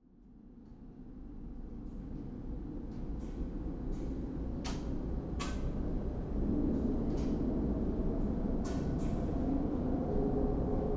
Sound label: Granville SkyTrain Station, Vancouver, Canada